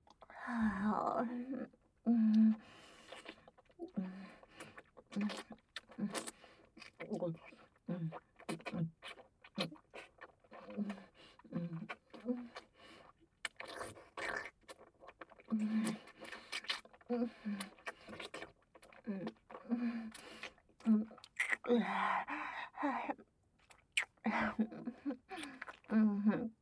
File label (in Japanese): 乳首責め＆ぐっぽりフェラ, ASMR